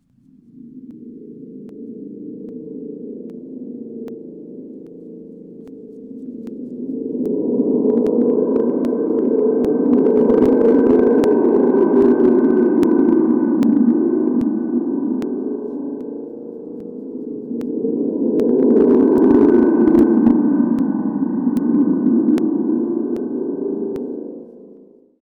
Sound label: Springdrum